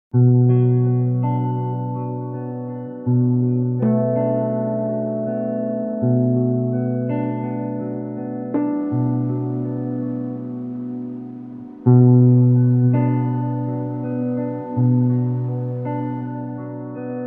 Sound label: Guitar and Bass Harmonica; One Shot After Cutscene